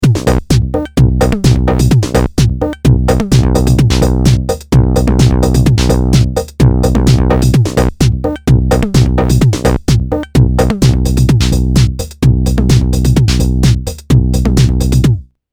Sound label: 8Bit Bass + Filter Dist